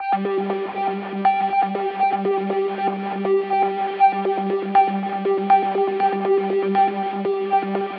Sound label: arp loop a 120 bpm